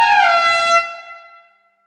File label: viking horn